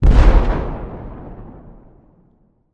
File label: explode